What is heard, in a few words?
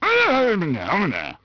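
male angry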